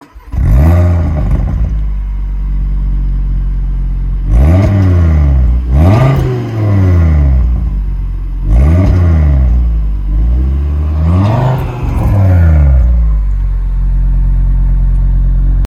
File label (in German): Motorstart, audi